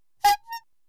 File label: whacky horn